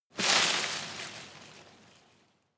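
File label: tree small leaves hit, garten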